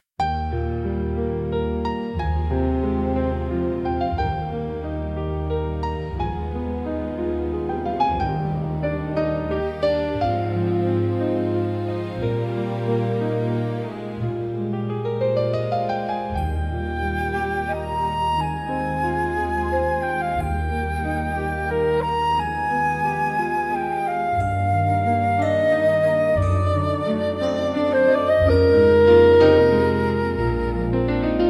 Enchanted Ballroom Waltz, fantasy music cute bright; disney style; fun fantasy world